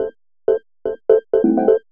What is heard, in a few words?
HOUSE EXTRA, 125